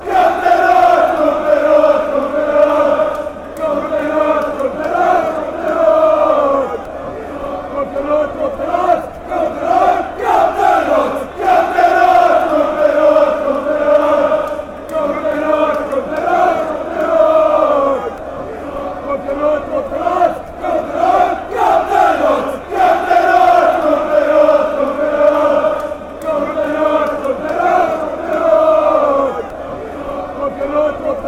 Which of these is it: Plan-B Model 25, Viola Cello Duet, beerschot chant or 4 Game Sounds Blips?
beerschot chant